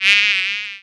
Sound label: attack; chocho